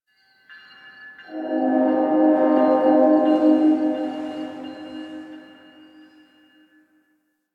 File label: Distant Train D; Warframe; Ambience; NineteenNinetyNine